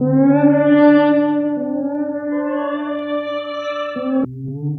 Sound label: Tempeh Horn, Woodwind